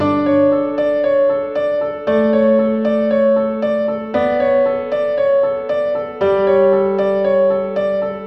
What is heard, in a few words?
d major gorgous classical piano loop 116 bpm; LOOPS